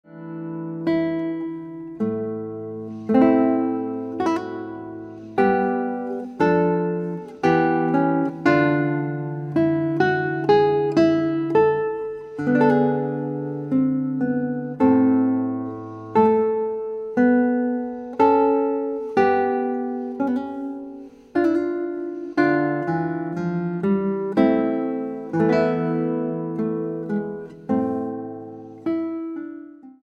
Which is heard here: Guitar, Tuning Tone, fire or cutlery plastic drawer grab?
Guitar